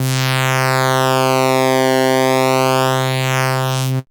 saw ring mod; AMG - Vince Clarke Lucky Bastard

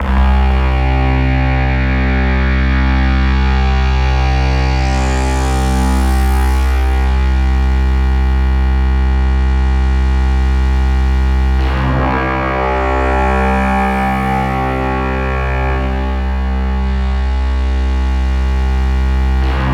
C1, SynthLine; NiceBeats

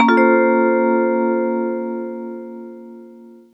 TV Suspense Vibez A#, Lounge 135; Zero-G Kocktail Kollection; Construction Kitz (Lounge); TV Suspense Mixes 135

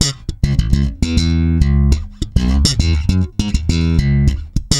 HARDFUNK C#, Bass; Spectrasonics; Bass Legends

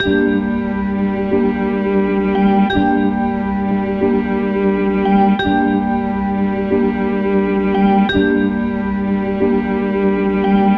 Motion Strings; Sonic Foundry (Sony Creative Software) - Club Joints and Street Anthems; Time Traveller

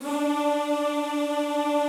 D3-R, Classical Choir; Best Service; Extended Classical Choir

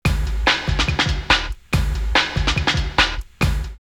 Drummer 142, drumloops Techno House; Monkey Drummer; X-Static Goldmine

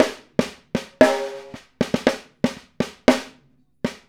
Snare Pattern, Drums; Essential Percussion

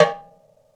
Rocket Tom, Tom One-Shots; KJ Sawka - Drum n Bass & Beyond; Drum Tool Box

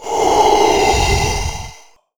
SLOW INHALE; Rarefaction - Poke in The Ear With A Sharp Stick

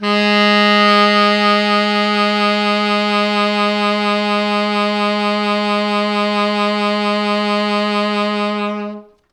T Sax G#2

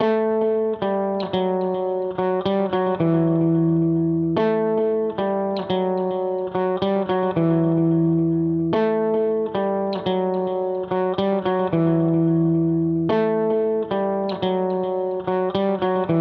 Guitar Lead, 110BPM Emin; 99Sounds; Monosounds; Music Loops; PANDORA BOX!; Waterfall

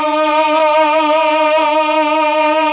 CHOR, CHOR+OBOE; ILIO; Propeller Island Legendary M400

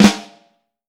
FLAM, Vintage Snare Drum; Q Up Arts; Johnny.C's Vintage Snare Drum; 6.5X14 LUD

